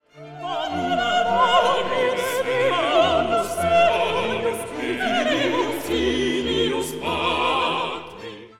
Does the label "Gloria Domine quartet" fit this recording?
Yes